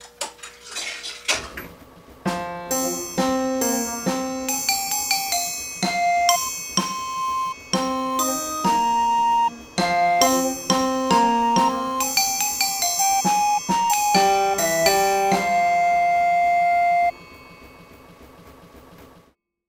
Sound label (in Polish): Orkiestrion; Instrumentownik